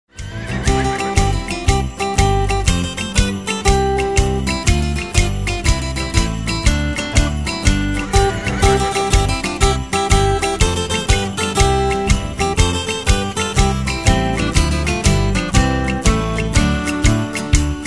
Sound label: karaoke instr; childrens favorite songs; disney